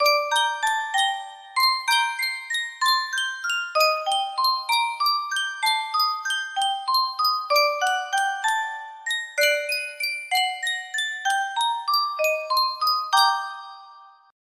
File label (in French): Sankyo boite a musique